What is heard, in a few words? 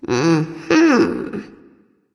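nonverbal agree; smite; ratatoskr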